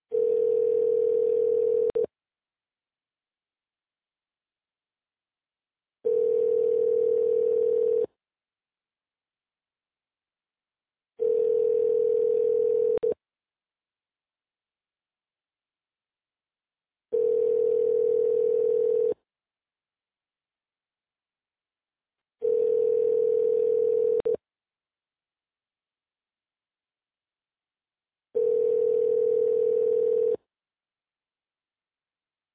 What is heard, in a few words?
ringing mono